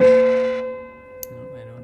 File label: Prepared Piano; Battery 3 Sample Kits